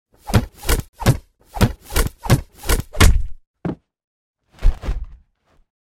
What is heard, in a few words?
Block-Punch-Body Fall-Flip Combo; Hollywood Edge Martial Arts and Human Impacts